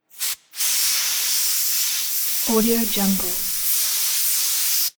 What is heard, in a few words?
air compressor